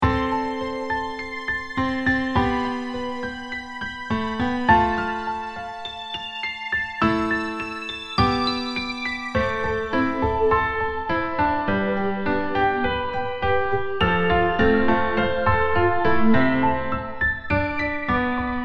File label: destiny formation piano alternate key